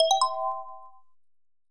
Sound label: present; GoldSrc